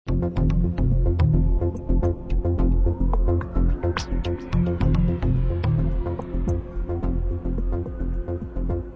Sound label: Loop Tense